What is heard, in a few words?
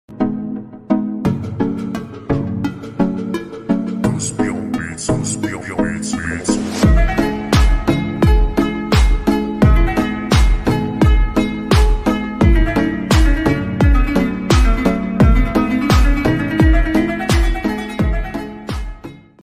azeri bass boasted ringtone slowed ringtone